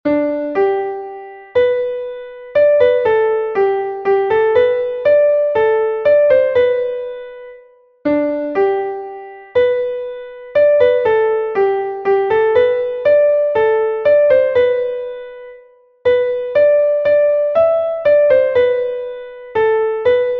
auf auf ihr wandersleut klavier

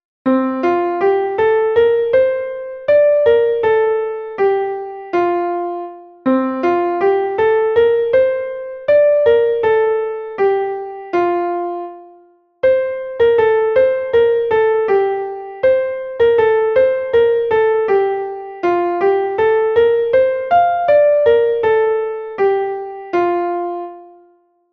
ein maennlein steht im walde, klavier

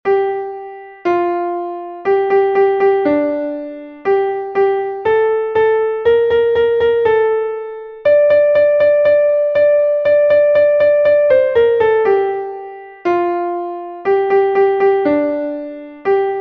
kanon klavier; hejo spann den wagen an

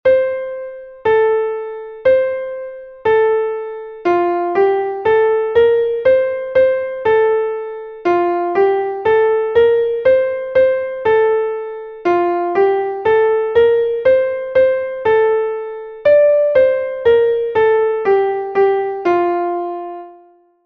martin war ein frommer mann, klavier

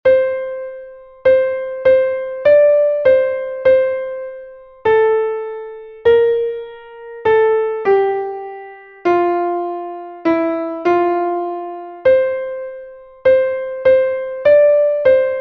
es ist ein ros entsprungen, klavier

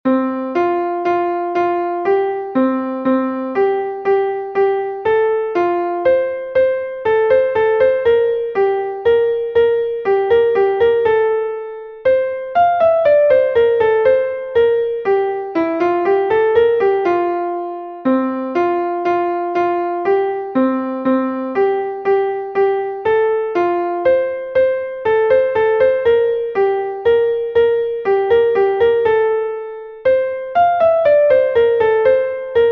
kanon klavier; es toenen die lieder

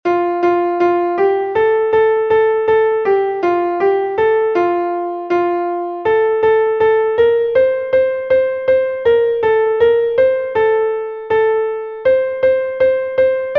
auf der mauer auf der lauer klavier, Kinderlied; MelodieMeister

